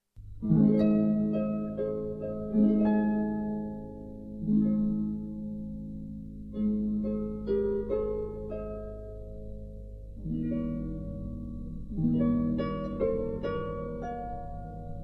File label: Ukrainian tenor art song; Ukraine